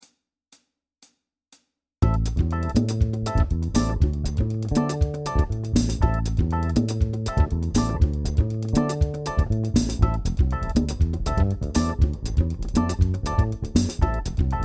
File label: groove